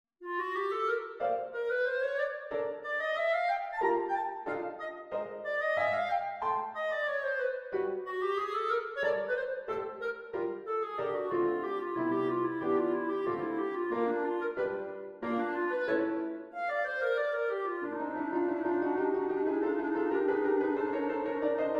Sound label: CLARINET and PIANO